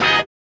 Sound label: dub, hits; RoBKTA Sample Pack Supreme